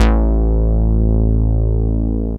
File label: bass